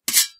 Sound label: sword Metal; Foley; Kenney's Sound Pack